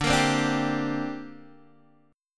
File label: D 7th Suspended 4th Sharp 5th, down, Guitar, DADGAD